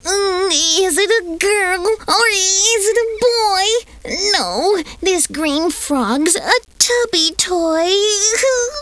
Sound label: frog; Lost & Found - Volume 1; OmniMedia; puzzles; pond